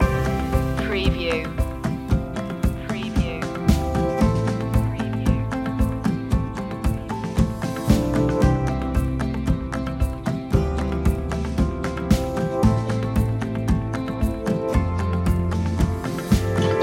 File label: turn that frown upside down loop